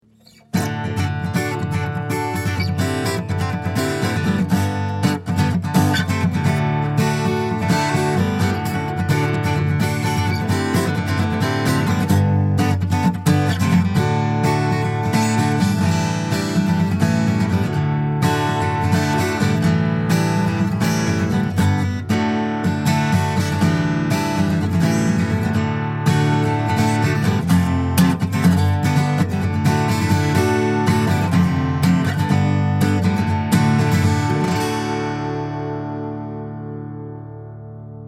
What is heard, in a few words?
Guitar